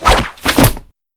parasol open; neopolitan

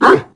bark; anubis

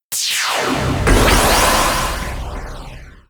resonance; neopolitan

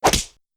whap

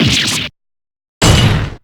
damage; blackquill

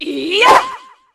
slash; p4u; mitsuru

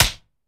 point; uendo

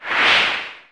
pose

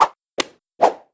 cane point

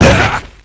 fall; kanji

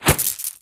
beads; nahyuta

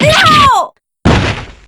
super damage; weiss

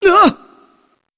ngh; clive

